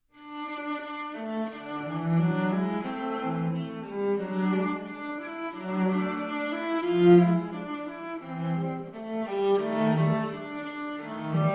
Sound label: Hungarian Song, Duos